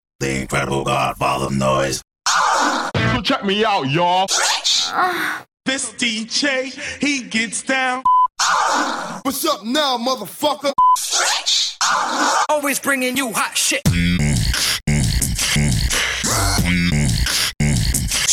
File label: Scratch Tool